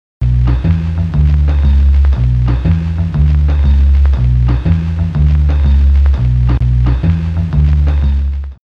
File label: oloop; ik multimedia sampletron